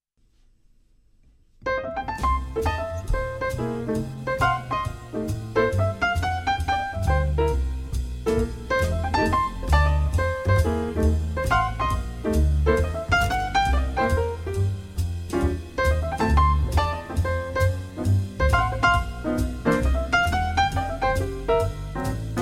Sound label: blues